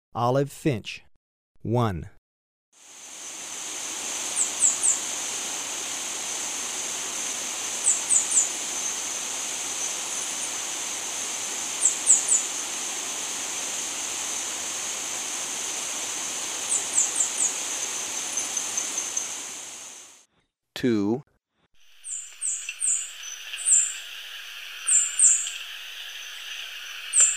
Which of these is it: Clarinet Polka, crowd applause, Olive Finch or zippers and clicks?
Olive Finch